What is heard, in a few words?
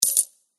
coin get; Danger Mouse - Ultimate